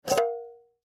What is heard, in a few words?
Jar Remove Lid, Jar Remove Lid Glass; Jar Remove Lid Fast Hard Glass; Food; Foley